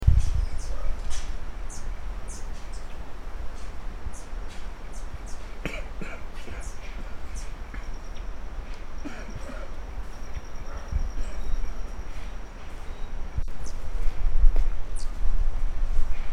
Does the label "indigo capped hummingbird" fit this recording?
Yes